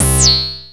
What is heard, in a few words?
Anasound Short to C, Industrial Analog Single Notes; Sonic Foundry (Sony Creative Software)